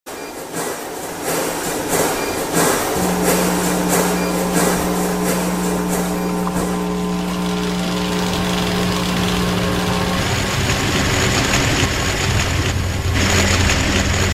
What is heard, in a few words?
Modern Industrialization Sound